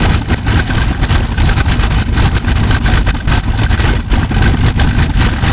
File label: Aircrafts Engines DB605; DCS